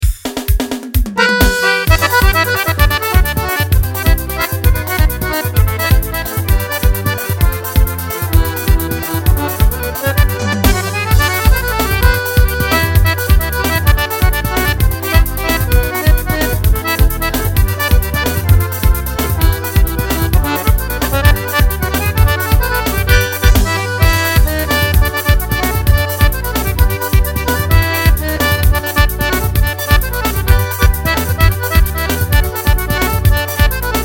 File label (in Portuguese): forrozão solo